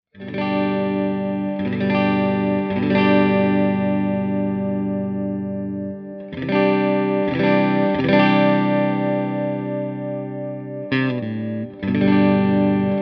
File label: Steel Pole Middle Clean; Revel; Mojo